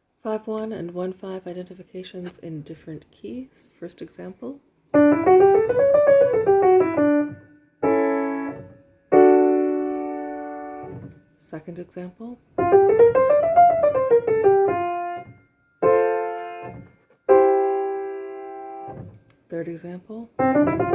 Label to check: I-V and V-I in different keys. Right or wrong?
Right